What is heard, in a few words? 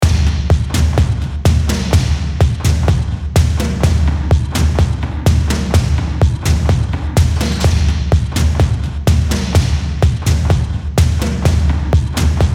PERCUSSION ONLY